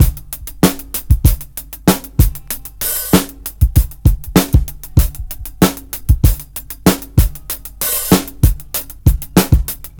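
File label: drum, Breakbeat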